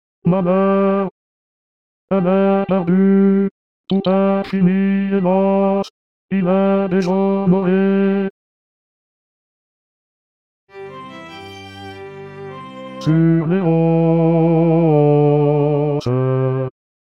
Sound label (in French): opera triste Basse